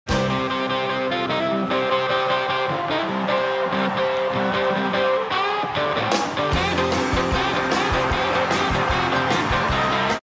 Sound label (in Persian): Rock and roll, موسیقی راک اند رول بی کلام